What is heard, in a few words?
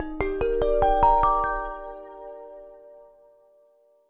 Ring